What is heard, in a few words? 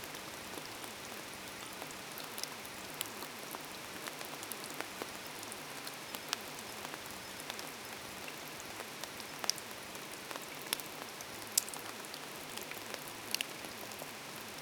heavy rainforest rain rai